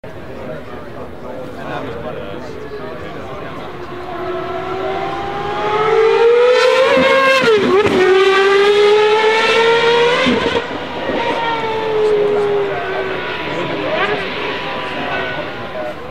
Gordon Murray, اگزوز

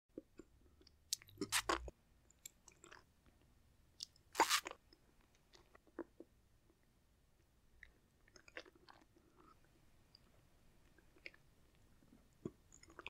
eating jelly

